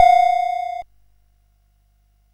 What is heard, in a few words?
Dark Castle, CSG8580R5